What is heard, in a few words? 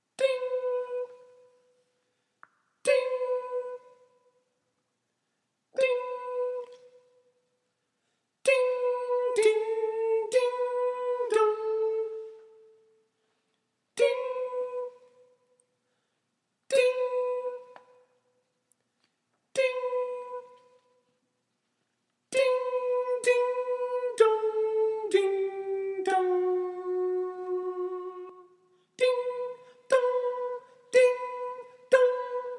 Carol of the Bells SSAA